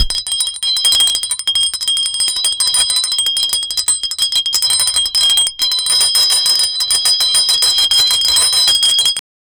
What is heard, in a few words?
school break bell